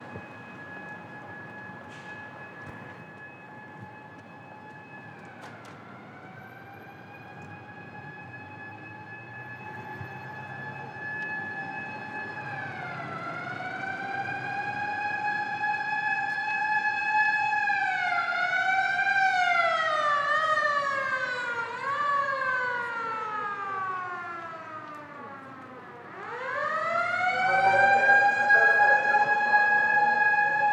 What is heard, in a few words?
Traffic, Firetruck; City Sounds